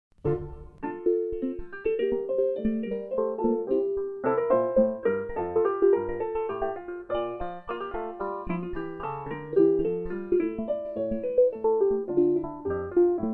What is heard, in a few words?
menuet